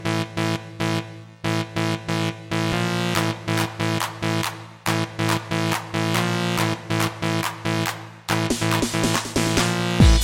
RnB beats

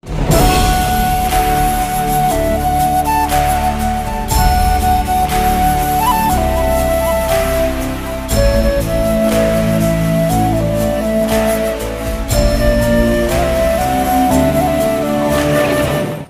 flute; Bollywood

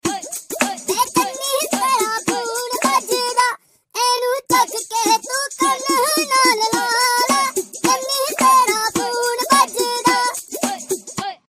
funny punjabi tone ringtone

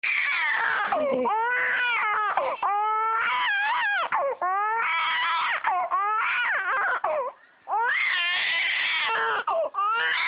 baby crying

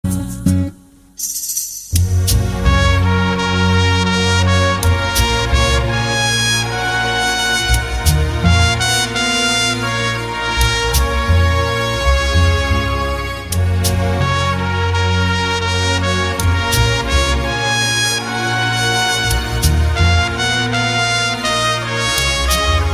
saxphone; Thuppaki

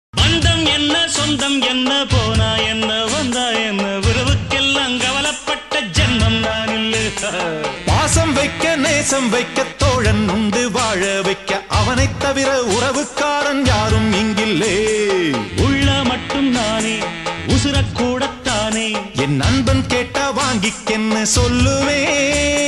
beat of tamil friend; Indian

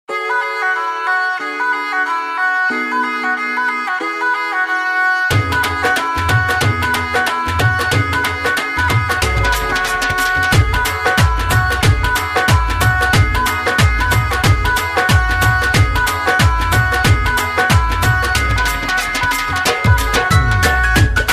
flute and drum; Indian

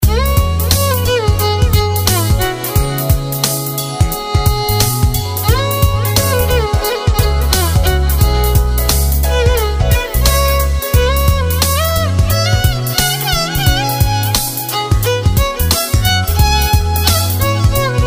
violin beats; Electronica

